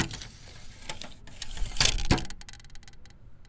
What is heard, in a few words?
Boyioioing; BB Retail Therapy Sample Pack